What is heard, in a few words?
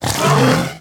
Spider Spitter Scream Start Combined; Deep Rock Galactic Boardgame